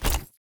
ui submenu open; Shelter

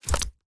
ui submenu open

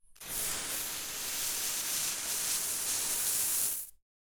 ambience room forge working layer

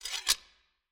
ui player sword put

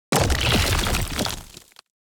building destruction build

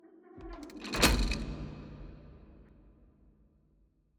torture cage close; ambience room

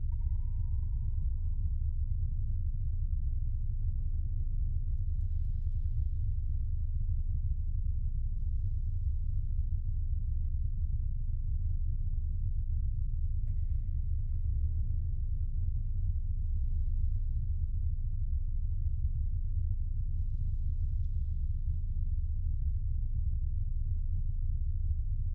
ambience main ambience basic

